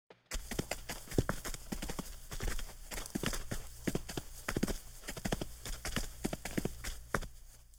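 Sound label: stomping; Smeshariki Cartoon Studio; Topot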